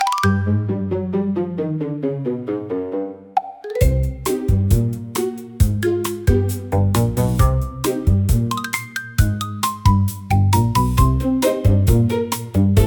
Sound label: Marimba Sneak, comical marimba for funny scenes